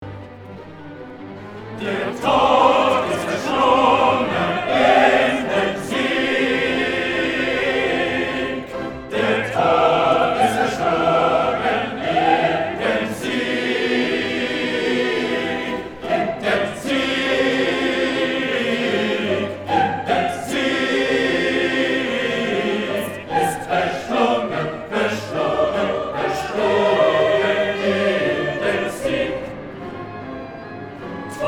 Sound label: Bass; Brahms Requiem; Thomas Tallis Society